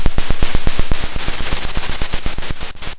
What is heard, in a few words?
pop, Sound of a drum roll